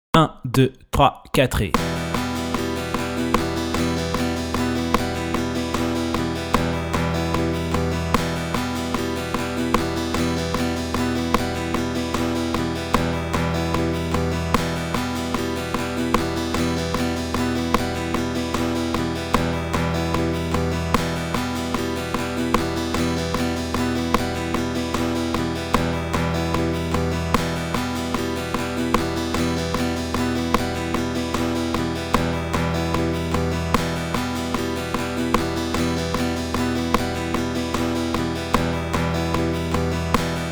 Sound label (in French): Flèches vers le bas et le haut